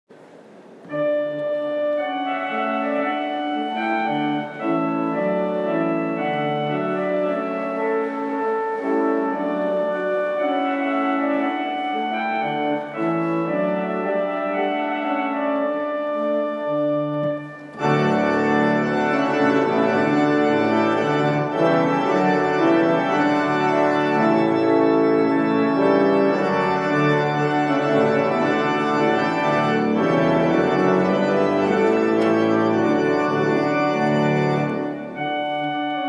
trumpet voluntary; clarke

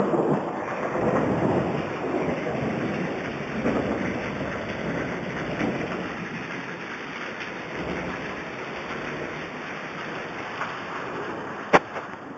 thunder rain over kigali

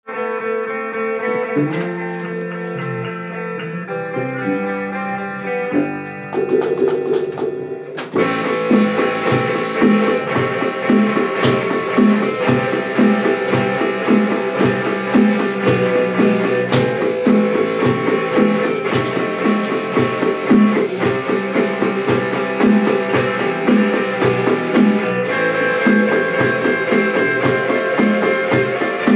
live drums

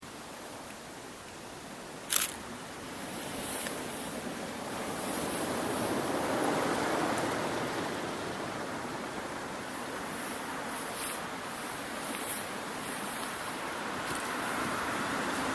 beach soundscape

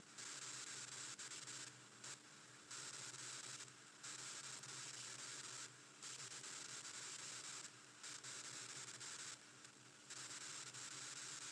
annoying sound from speakers monitor

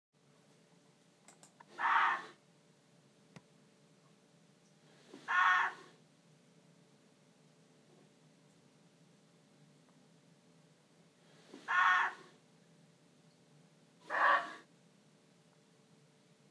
muntjac deer sounds

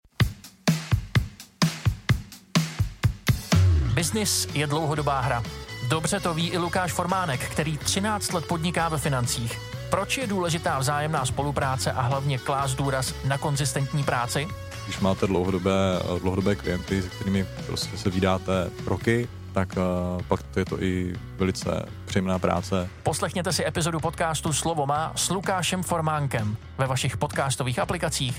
jingle ad

